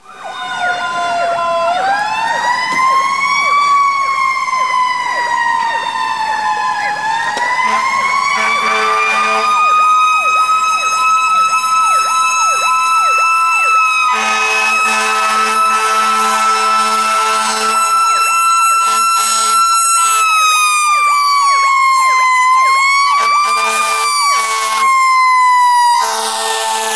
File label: fire trucks; quake2; kitsune